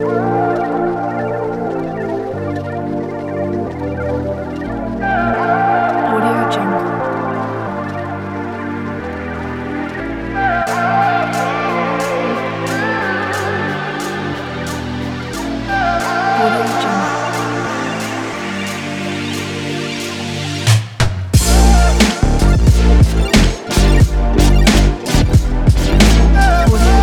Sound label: hiphop beat; danastudio